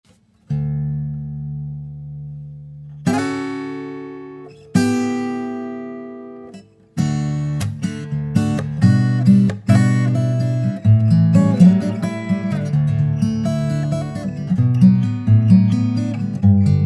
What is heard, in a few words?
Guitar